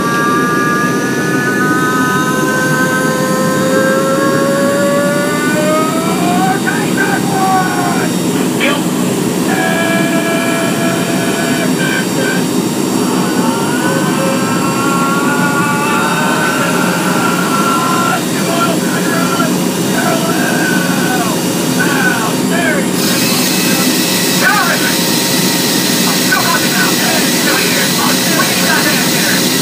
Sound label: RINGTONE